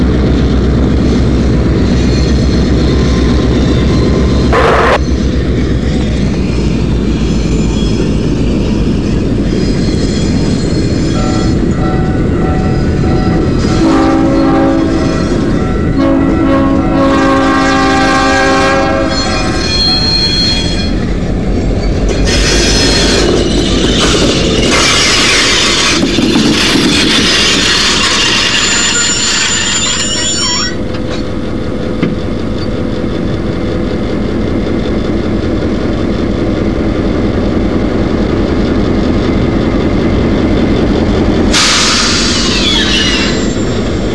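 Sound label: yardwork, train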